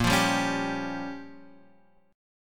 Bb Minor 6th, Guitar, Open F; strummed; JGuitar

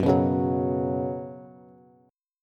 Gb Suspended 4th Gb, down, Bass; strummed; JGuitar

